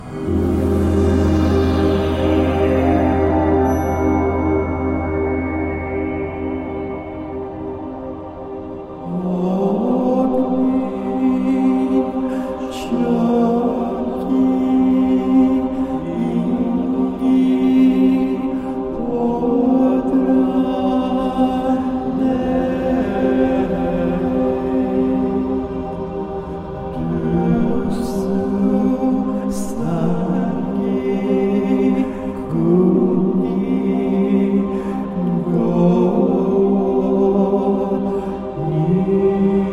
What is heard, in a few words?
Mantra